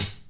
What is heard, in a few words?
sharp hip hop pedal hi hat sample c key; hat samples